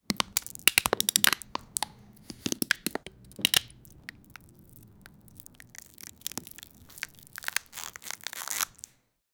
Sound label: asmr, breaking